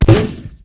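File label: grenade launcher; alienshooter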